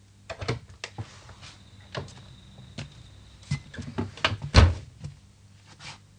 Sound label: door open close; doorSounds